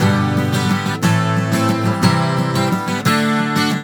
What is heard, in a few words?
GTR, Celtic Flavours; USB Soundscan vol.42; AKAI